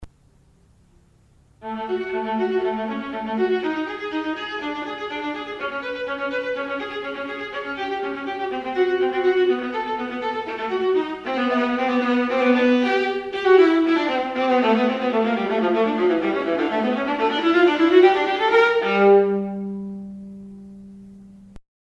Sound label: viola